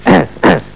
huh; krsnake; workbench spiele

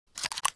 shotgun fill, weapons foley; Spearhead Demo; MacFormat 2003 June

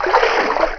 swim; SpecOps2 MPlayer Demo

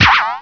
Get Key; PowerPete Demo

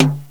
Surdo Mute, Drums; GMPlay; XG

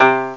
koto; Amiga Special - Software Hits; orchestra; keystring

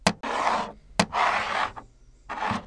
whiteboard

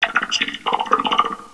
ROBOT

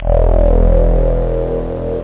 Spacious Sweep

